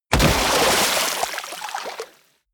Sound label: body fall water harsh shallow; krieg-waffen; nahkampf; soundpool